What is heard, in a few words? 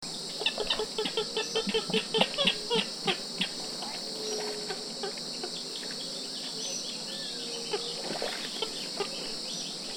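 Porphyrio martinicus, bird; گالینول بنفش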